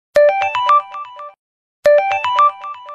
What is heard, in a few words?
Redmi Note 8 Pro Sms